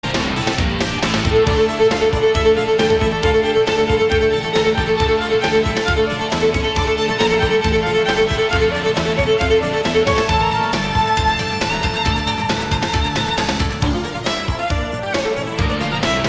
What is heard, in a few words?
tacatta and fugue in d minor